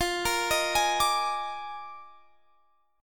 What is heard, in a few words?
D sharp Major 7th Suspended 2nd Suspended 4th F, chord